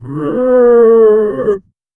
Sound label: occasional anguished groa, zombie